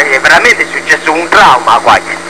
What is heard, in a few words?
Ooff